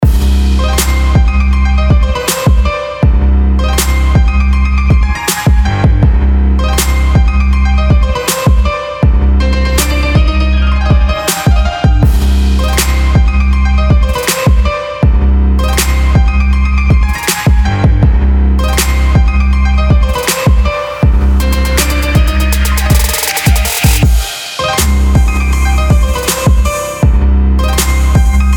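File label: pianino mix, piano mix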